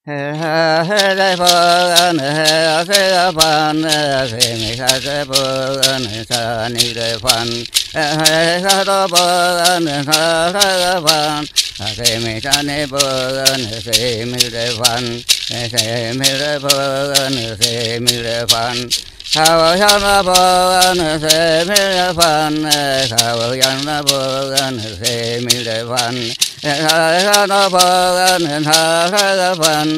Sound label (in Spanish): guarijio gato montes musica y cantos; en la tierra de venado